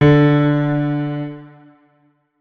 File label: notes, keyboard piano; Hideout Furniture